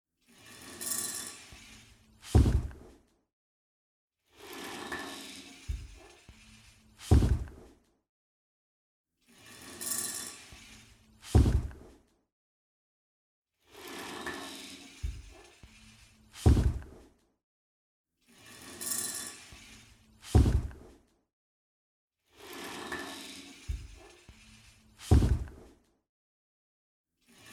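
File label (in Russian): гигантский монстр медленно и тяжело шагающий тащит большое металлическое оружие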